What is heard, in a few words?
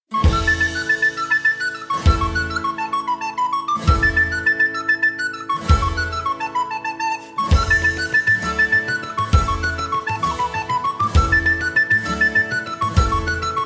Jigs